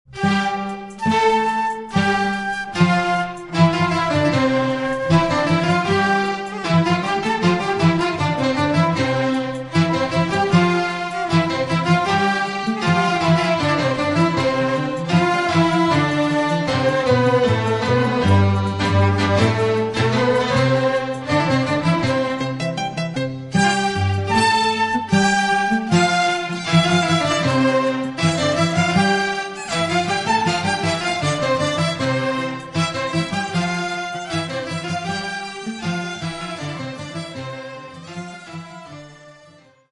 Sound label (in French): musique andalouse marocaine trad